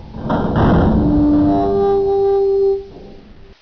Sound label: creak; bismarck